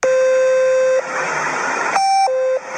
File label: Sepura Call End